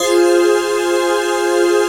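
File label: chord pad, Pads; Best Service; XX Large - Pads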